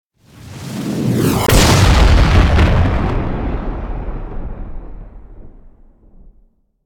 Explosion Heavy Pulsate Bomb Explosion; Hollywood Edge; sound designer's toolkit v2

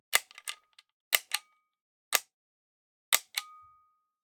Generic Button Metal Small

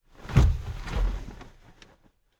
foley hit; driver; Blind Drive

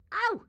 grandma short yell; Blind Drive

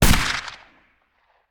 Musket; Sound Ideas; Guns

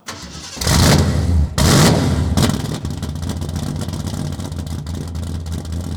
Mustang GT350 Start Loop; Automobiles

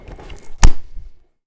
Book Large Close; SFX Kit; Foley - Misc

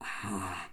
breath heavy in; Apotheorasis

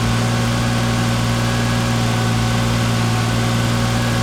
Porsche 911T Loop; Automobiles

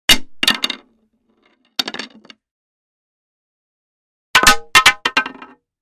Stick Drop 2X; Hollywood Edge; Martial Arts and Human Impacts

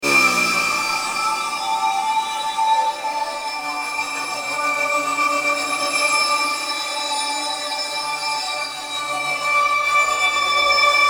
Drones; RoosterFX; Eary Playful Expectent

